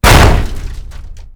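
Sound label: debris metal medium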